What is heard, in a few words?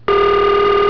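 ring, telephone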